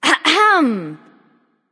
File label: clear throat; smite; skadi